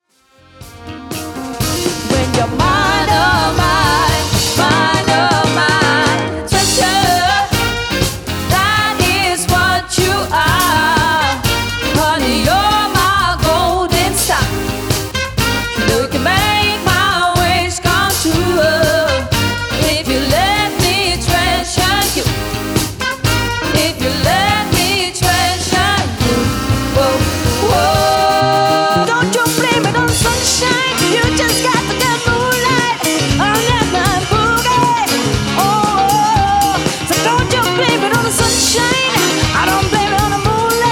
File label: Funky Floor mix